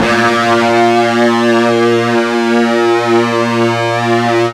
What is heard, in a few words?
FUZZ A#1, ULTRA FUZZ, Guitar; East West; Steve Stevens Guitar Samples Collection